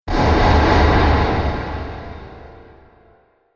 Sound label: Fantasy Explosive Impact; Miscellaneous Impact; Andrew Fleming